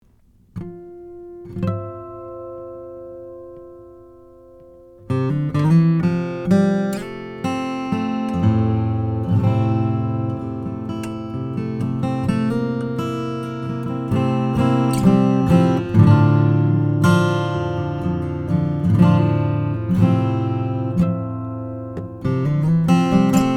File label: indian rosewood, guitars; greenfield gf